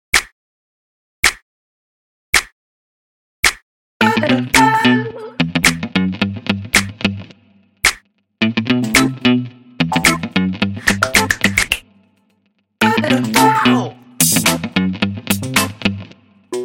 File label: ringtone